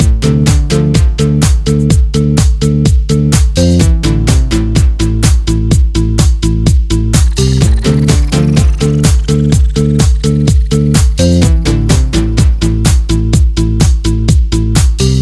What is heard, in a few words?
dance; cataclaw